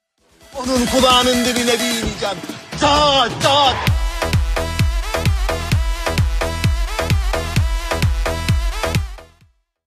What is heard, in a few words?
club mix